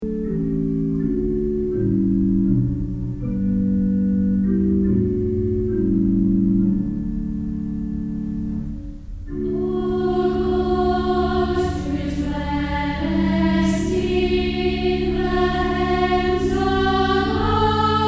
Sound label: hymn short version